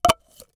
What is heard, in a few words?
Household Cup Stack